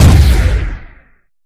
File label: rocket, weapons; redeclipse